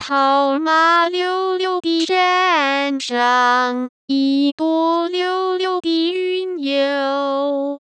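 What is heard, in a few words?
Mandarin Singing Voice Synthesis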